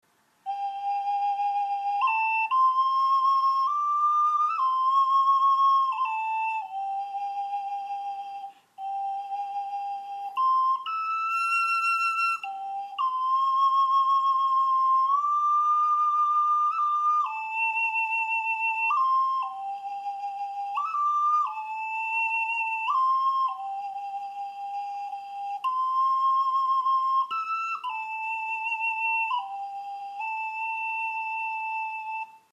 Kinderflote Pentatonisch

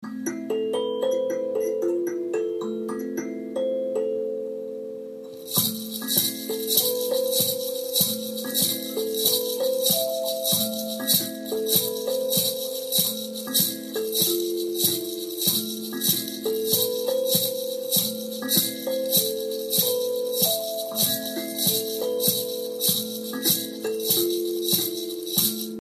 Shaker Fuss

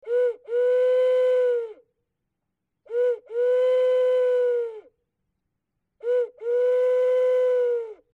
Holzeule

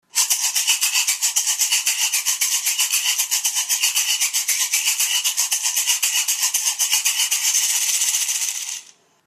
Bambusshaker Geschlitzt Gross

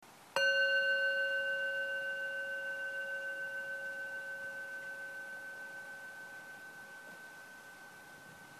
Klangschale 11cm; Boen

